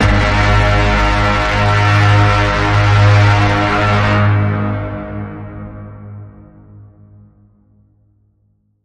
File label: Bend, G sharp